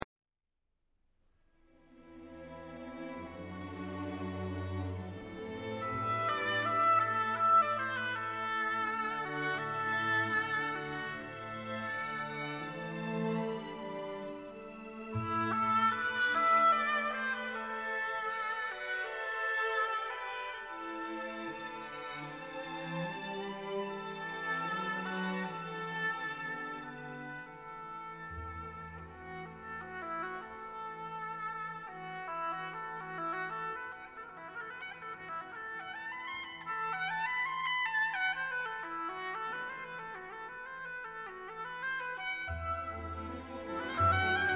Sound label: Oboe Concerto; RVW